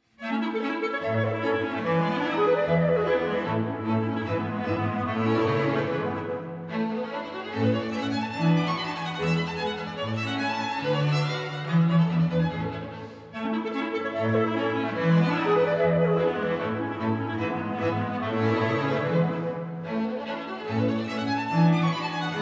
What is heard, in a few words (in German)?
Klarinettenquintett; Mozart